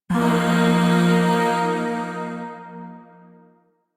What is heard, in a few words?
Shawzin Pent Min Chord C; Music; Chords; Warframe; Zariman Void Shawzin; DE